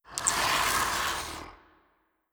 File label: zombie scratch, brickwall